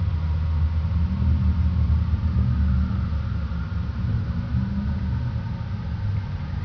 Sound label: cave; svencoop